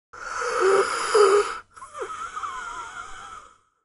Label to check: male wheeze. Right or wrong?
Right